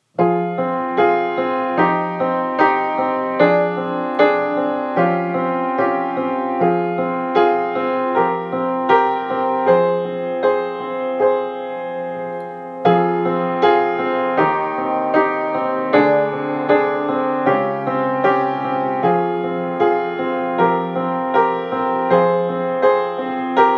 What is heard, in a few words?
Pleyel quart noir, piano